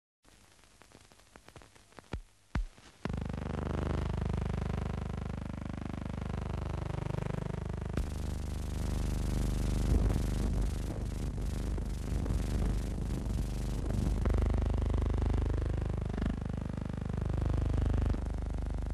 Damesvoetbalwedstrijd Women's association football